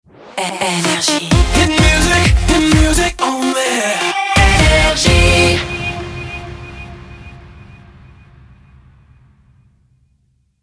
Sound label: Jingle Shotgun; NRJ